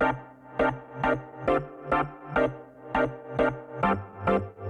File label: Chords; simple session